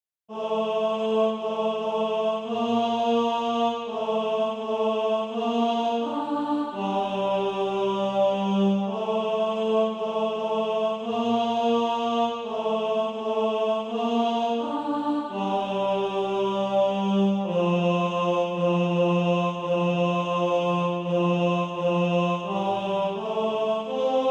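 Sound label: tenor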